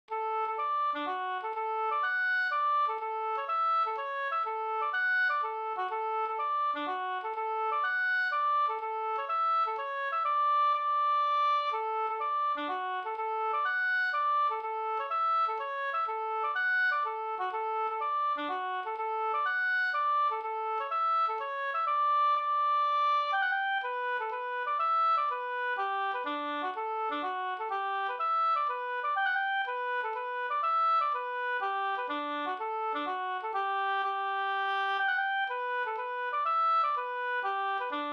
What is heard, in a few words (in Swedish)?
hamburska; Annas polska Taxinge Sd